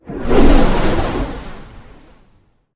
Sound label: Explo FireBall; BatteryCrate; Titanfall 2